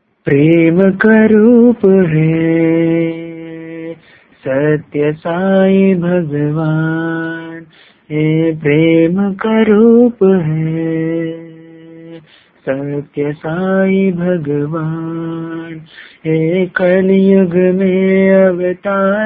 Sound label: Bhajan; PremKaRoop